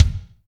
BD, DR BD; Best Service Gigapack 2

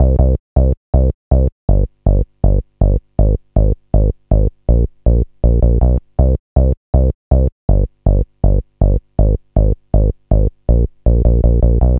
gro 160, Hardcore Loops, Bass Loops; Dance Synths

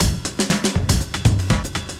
breaks, 120bpm; free bespoke breaks; BeatStyleG

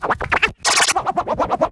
Vocal Scratches 140BPM

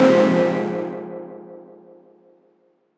Fm, Chords; Electronisounds

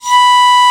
Piccolo p B3; Digital Sound Factory Virtuoso

